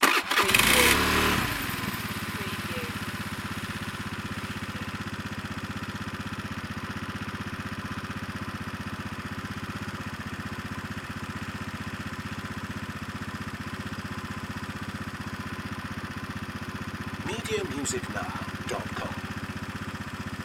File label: motorbike on idle off